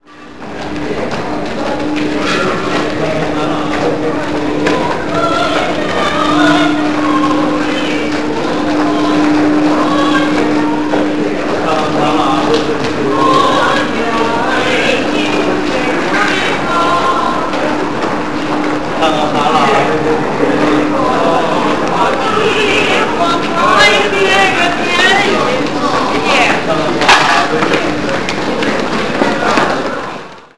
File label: xizhimen singers; china